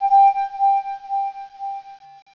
Echoed Voice; pokerogue; battle anims

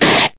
Slash; pokerogue; battle anims

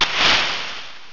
Sand Attack; pokerogue; battle anims

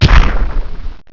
Mega Punch, battle anims; pokerogue

